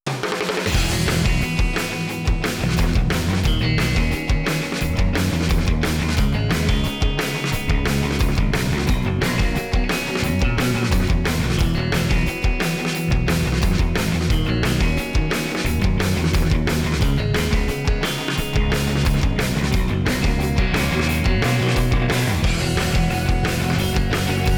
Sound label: Instrumental TV Size; Gintama